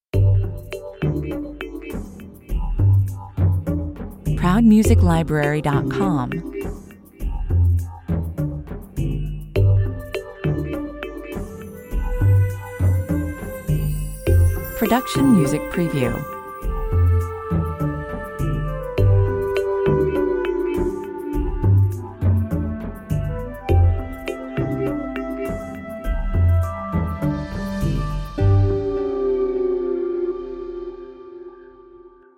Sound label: hopscotch and bubblegum 92 bpm